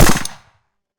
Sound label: Gunshot; Hovl Studio; SFX Battle sounds; WhiteMan Unity2D